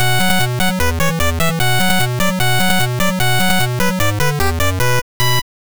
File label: swan lake; tchaikovsky